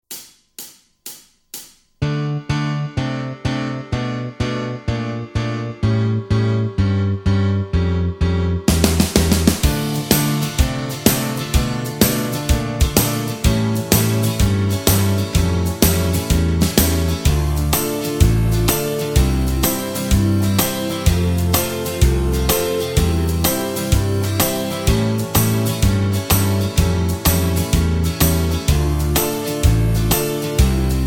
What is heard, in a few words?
No Lead Guitar; Dm